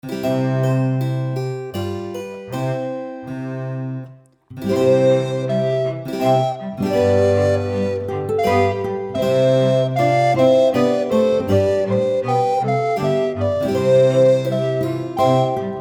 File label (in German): Menuett, Floetentoene; Jede Menge Floetentoene 1